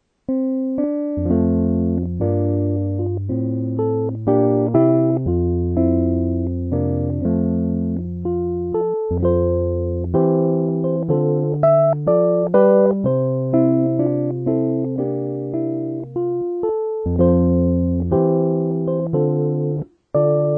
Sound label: Pianet